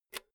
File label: insert jack cable quick